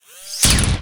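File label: small pistol; enemy, combat; CosmicRage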